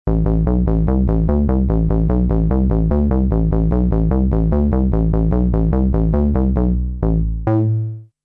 Revolve Bass; Artiphon ORBA 2

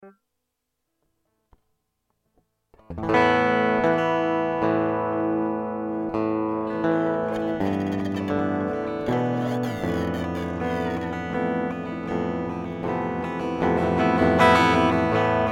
Travel Guitars

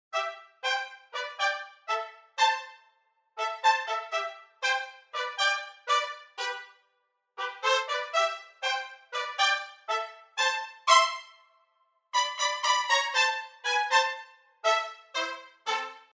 Swing More Trumpets Short

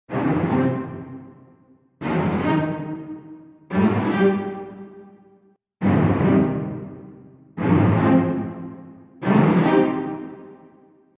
String Ensemble Celli Octave Runs up

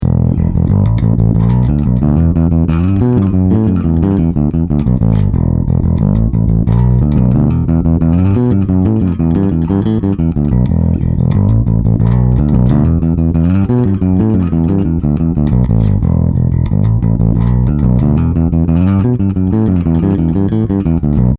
Peavey Headliner 1000

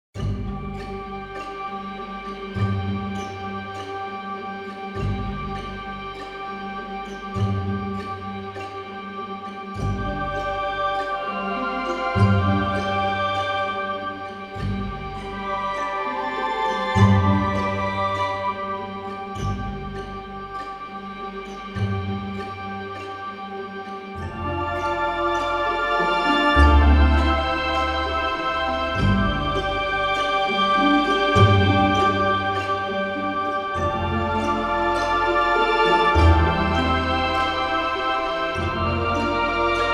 Orchestra

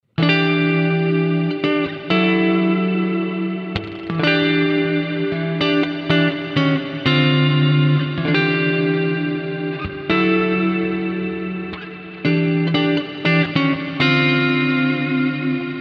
Eventide MicroPitch; Micro Dream

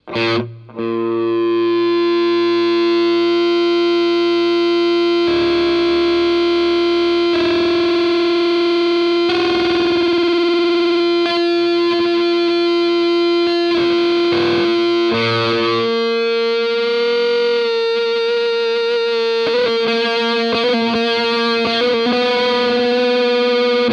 both sustainiac; Schecter Jake Pitts

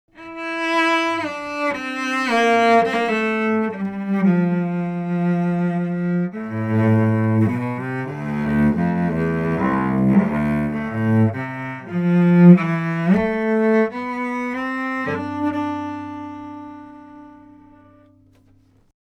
Neumann TLM 49 Cello

